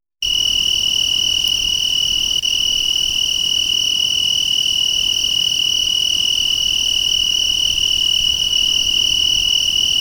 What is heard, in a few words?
the sound of crickets chirping on a warm summer night